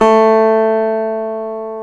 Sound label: GTR, Dobros, National